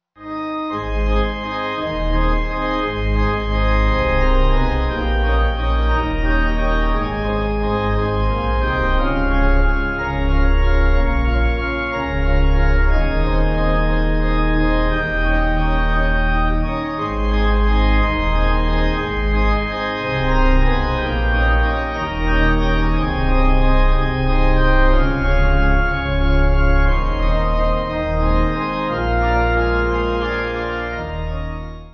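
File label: Pipe organ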